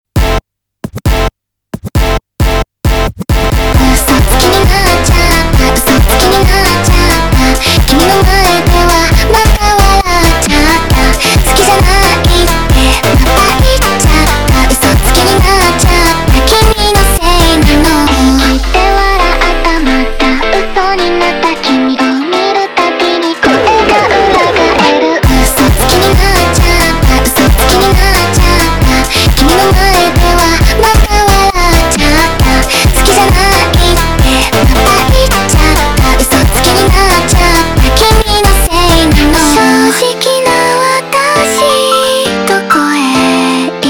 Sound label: funk